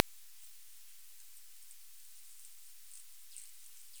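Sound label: Ozimops norfolkensis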